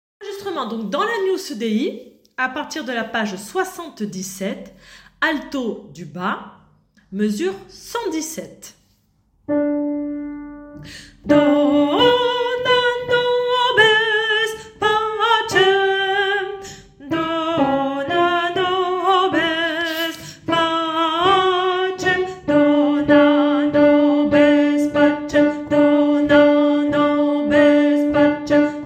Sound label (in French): alto bas; Agnus dei page 77 mes117